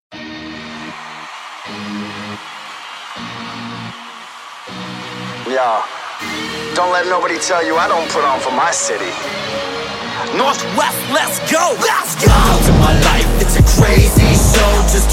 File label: Anthem; Seahawks